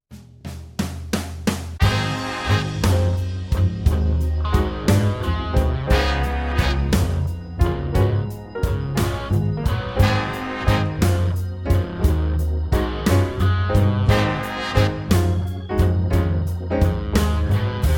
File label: Slow Blues